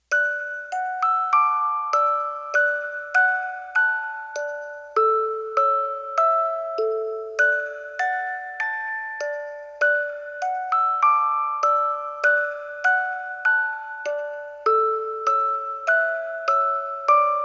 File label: Music Box Version; Mary Had A Little Lamb; Tiny Tunes; Narrative Mind